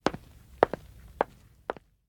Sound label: Footsteps Wood Walk Enter Room; The 39 Steps